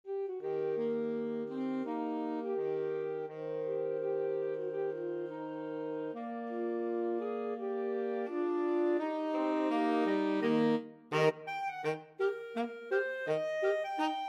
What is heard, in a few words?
flexible mixed ensemble, 4 players